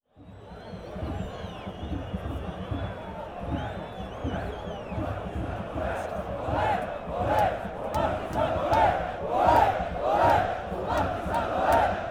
Partizan Score Against Red Star Partizan Stadium Belgrade Serbia